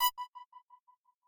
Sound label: synth